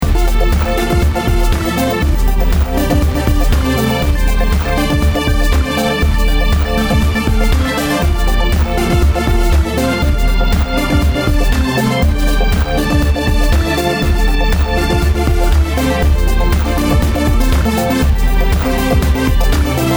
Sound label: hiphop